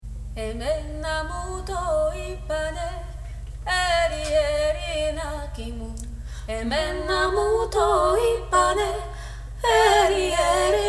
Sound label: Tutti; Emena mouto; Trad. Grec - Danse Kalamatianos - Arrgt. Florence Boué